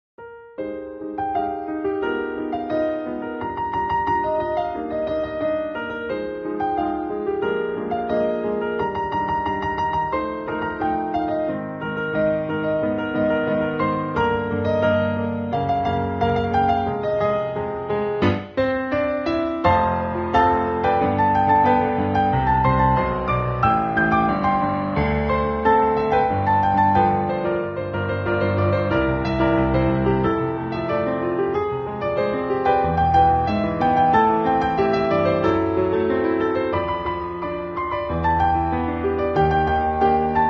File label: piano